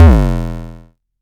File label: bass stabs; FutureRetro FR777